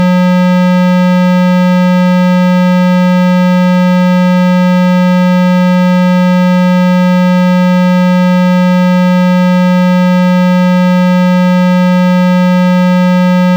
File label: techno trance; MED; Robin